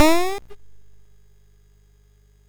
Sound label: MOS6581R2, sid; Defenders of the Earth